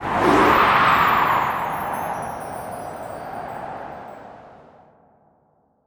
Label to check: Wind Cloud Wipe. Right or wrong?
Right